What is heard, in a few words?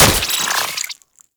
Infested Spore Pistol Mech; Weapons